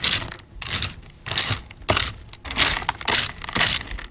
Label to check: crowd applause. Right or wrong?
Wrong